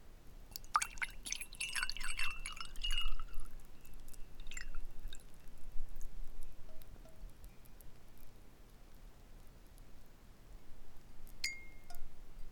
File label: Water Pouring Glass goblet